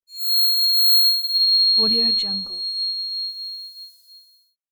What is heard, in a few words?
ear ring; پیچیدن صدای سوت در گوش; game